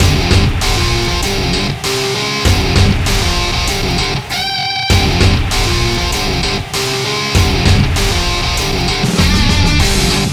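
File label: background music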